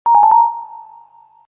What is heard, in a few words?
sync fail, Samsung SGH-i600